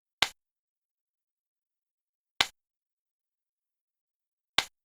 jap gavel touch

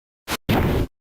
attack

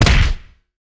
punch

